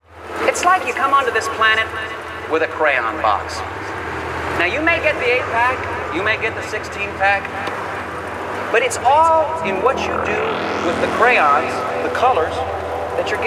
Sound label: trance workout mix